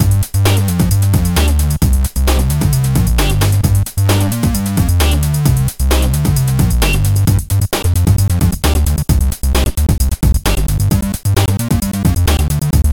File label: break synth